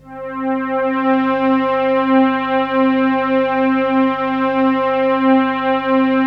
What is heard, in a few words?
DEEP PAD C4; DEEP PADS; Explosive Jungle; USB Soundscan vol.05; AKAI